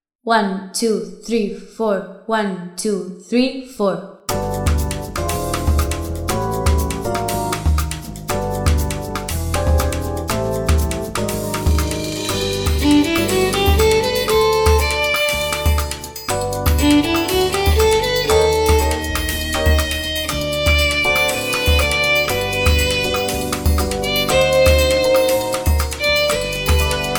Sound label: violin